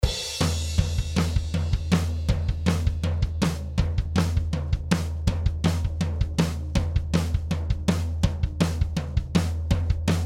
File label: drums; Black Lion Audio B172A; B172A 2A into 17 Drums